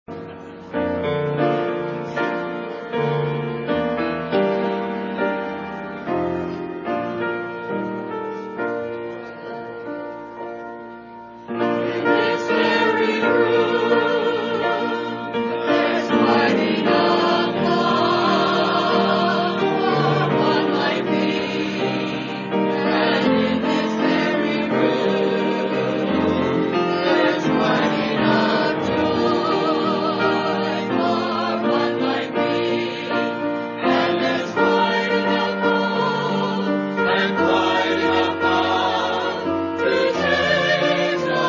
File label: choir